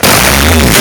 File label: very loud nose blow